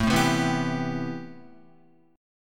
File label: A-Minor 9th A Guitar; strummed; JGuitar